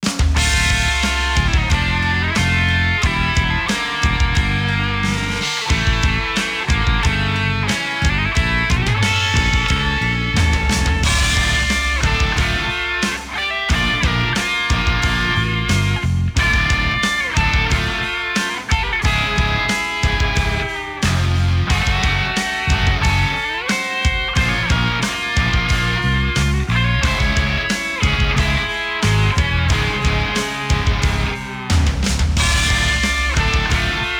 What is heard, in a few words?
Match Loss; mario strikers football battle league; Against - Toad